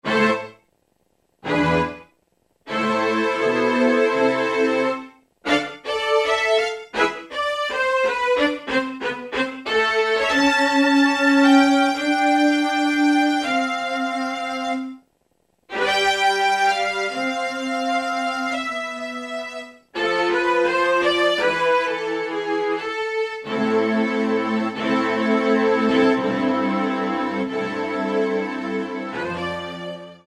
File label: ST Violins, Yamaha TG77; Factory Presets